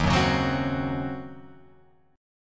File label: Eb Minor 13th Eb down Guitar Open F; Ebm13 strummed; JGuitar